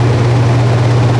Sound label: Small fan